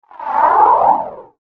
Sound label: Sweeper Jet Fly By; Production Element Imaging Element Accent Transition; Sweeper Sound Effects